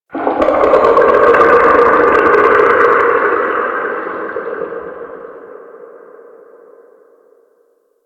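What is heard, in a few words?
Distant Eerie One Off, Shipyards; Warframe; Grineer Shipyards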